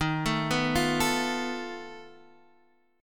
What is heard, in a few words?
B 7th Flat 5th Eb